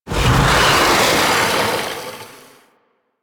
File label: Flight Whoosh; Warframe; Teralyst; Ropalolyst